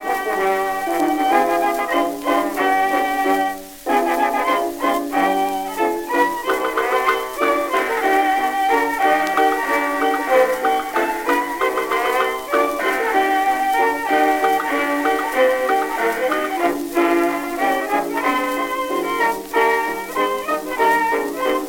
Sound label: jazz, jukebox